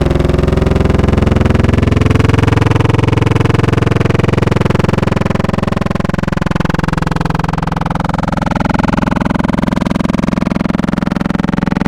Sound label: Growl, Wavetables